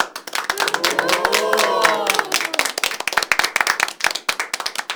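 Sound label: HAND CLAP + OHH, SMALL CHEER; 1000fx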